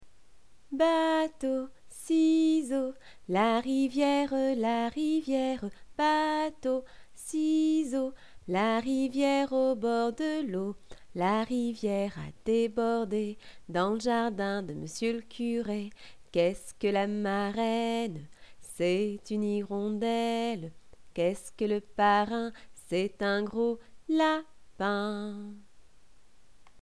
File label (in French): chant; Bateau-ciseau